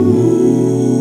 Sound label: oohueee; Truth-Hz - 1-Hit KO Sample Pack